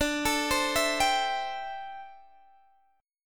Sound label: D 9th Suspended 4th D down Guitar DADGAD